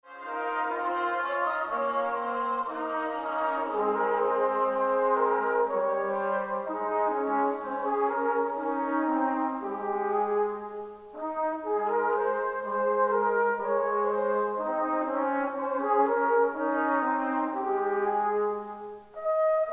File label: Sleepers Awake, Trumpet Ensemble